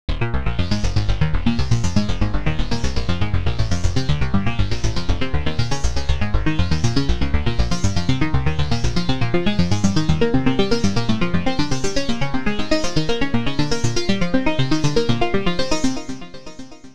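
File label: sequence black and white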